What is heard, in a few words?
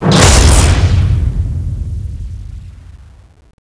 shoot; cstrike; weapons; gungnir